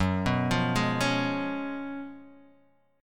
Gb Major 7th Suspended 2nd Suspended 4th, Guitar Open D; chordshape; down